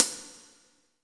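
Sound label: hi-hat, drums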